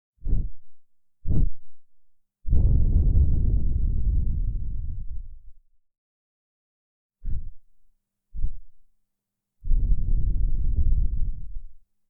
耳かきの後の吐息; クリスマスフリートーク

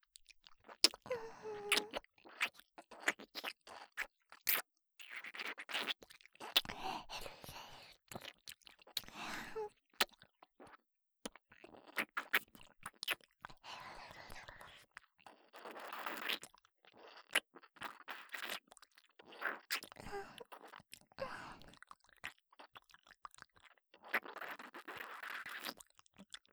フェラ音

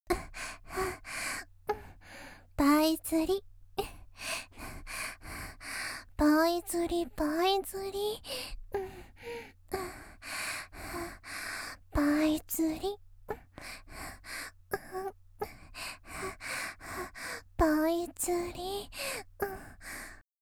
（パイズリ）と言いながら静かな喘ぎ, 少女; 元気な少女; パイズリ声

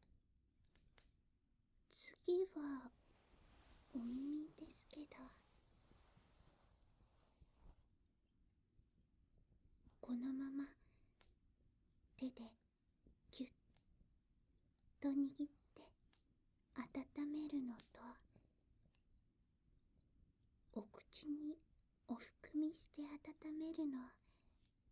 ゆっくりあまがみ右; 【アイヌ系】道草屋 すずな 囲炉裏の音【うたた寝音声】; 初日 囲炉裏

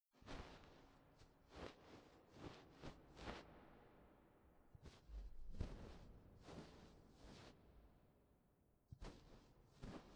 浮遊感のある耳かき, ASMR

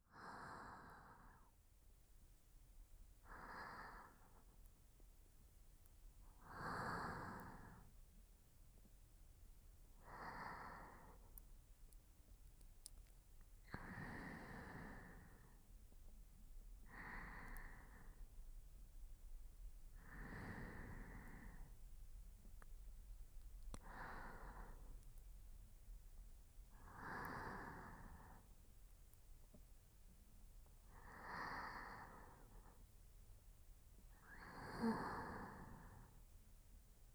特典トラック 寝息(右側)

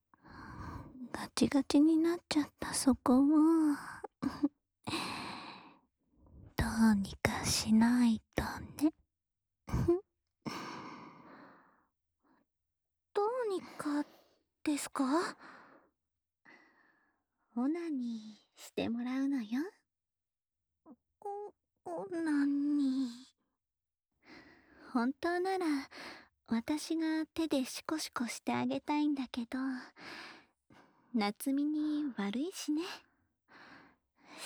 気持ちいい耳舐めとオナサポ（左耳）, ASMR; 気持ちいい耳舐めとオナサポ（左耳）

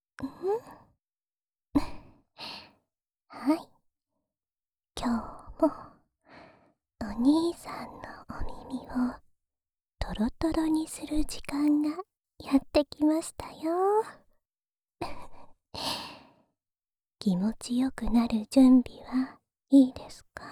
耳舐めループ（右耳）, ASMR; オナサポ用耳舐めループ（右耳）

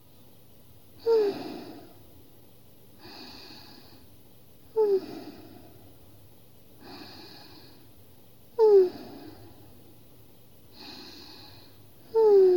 寝息 ループ; バイノーラル編集; 効果音なし

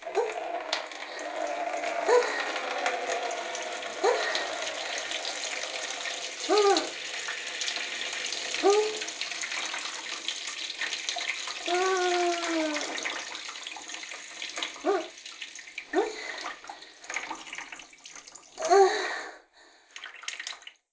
おしっこ お風呂場 ステレオ

